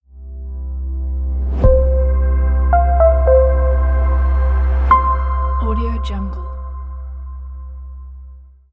simple logo

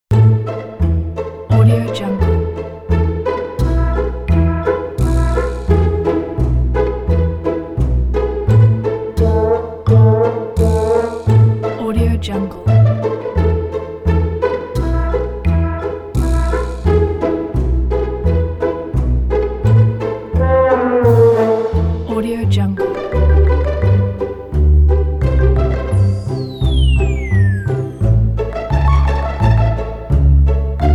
comic music; prihod-soynd

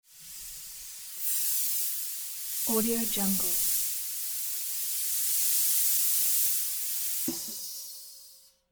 compressed air hissing; fascinatedsound

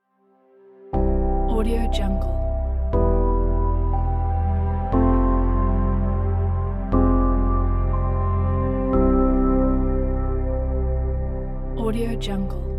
mild atmosphere ident

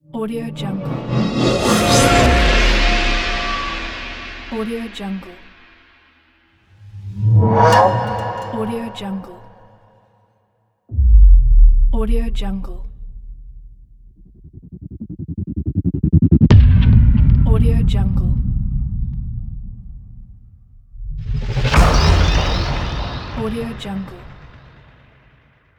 tense transition

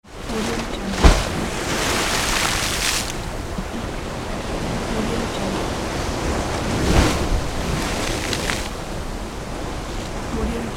waves breaking and splashing on cement pier

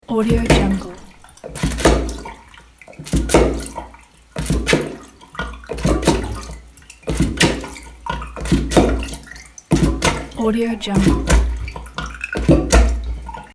rubber plunger

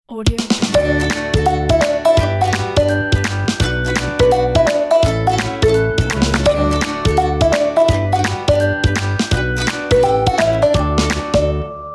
happy summer logo

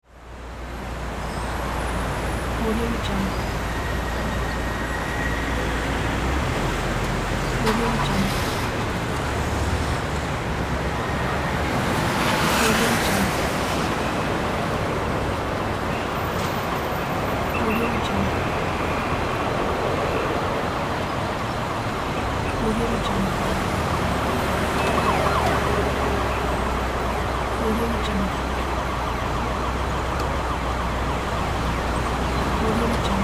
city sounds; hot dogs

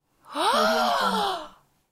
amazed emotions

